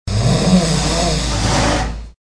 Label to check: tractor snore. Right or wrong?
Right